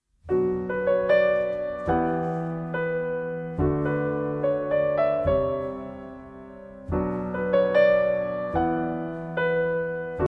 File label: fortepiano ringtone